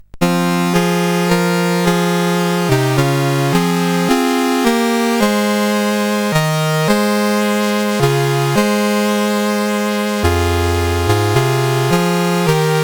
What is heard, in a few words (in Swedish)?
Svensk Melodi, CSG8580R5; Svensk Melodi 2